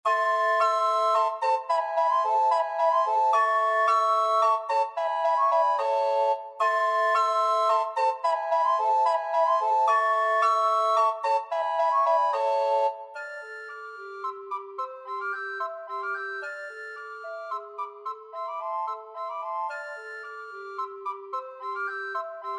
dvorak slavonic SSAA, recorder quartet

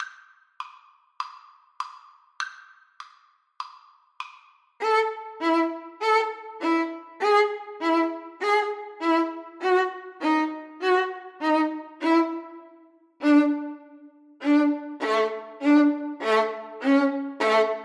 VLN, Violin Duet

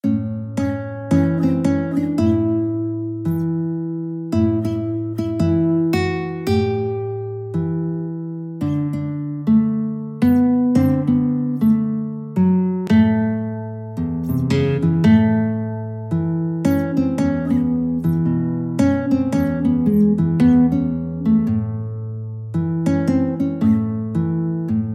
Blues, guitar; Dallas

